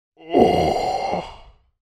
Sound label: human male groan pleasure; zapsplat